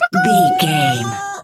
chicken bwak

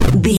retro impact hit general

